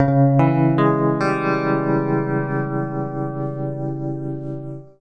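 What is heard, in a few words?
Guitar; BLOOD2; Ancient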